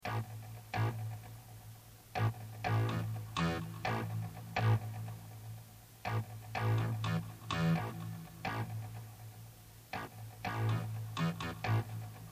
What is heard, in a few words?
riff